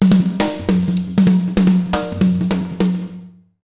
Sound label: BEAT, Jazz Latin Drumloops; USB Soundscan vol.08; AKAI